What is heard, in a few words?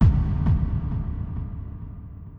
kick ambient